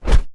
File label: complete